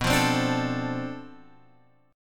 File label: D-Minor 7th B, chord; Dm7/B; strummed